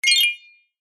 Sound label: multimedia alert notification glassy high pitched short positive